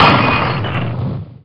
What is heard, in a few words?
SPLITTER